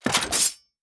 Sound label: Trap